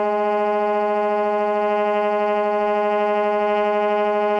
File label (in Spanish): Tremolos